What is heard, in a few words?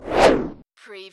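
Servo Panel Sound - Swish right to left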